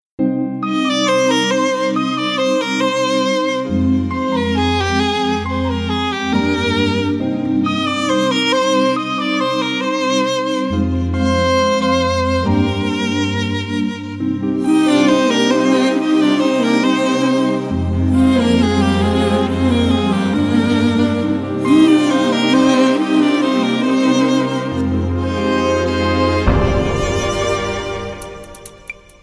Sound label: Violin Tone